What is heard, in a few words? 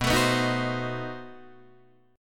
B 7th Flat 9th B Guitar Open D